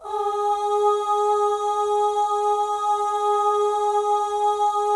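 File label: OH, female OHS, Voices; Symphony of Voices; Spectrasonics; FEM.1 OHS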